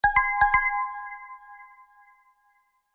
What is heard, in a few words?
ringtone, sony